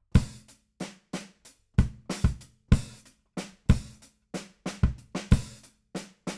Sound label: BD Out